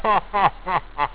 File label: laugh